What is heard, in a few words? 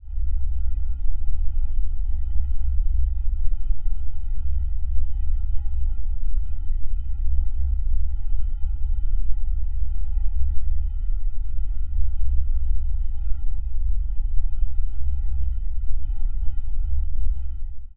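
spaceship hum; spacecraft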